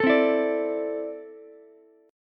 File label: C 6th Add 9th C down Ukulele; strummed; JGuitar